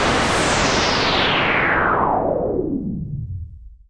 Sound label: noise SH101, Noise Sweeps